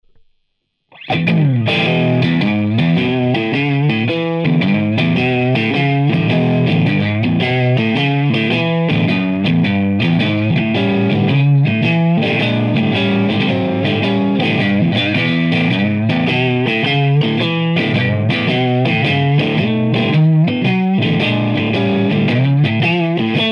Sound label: lead